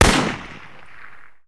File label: npc rifle shot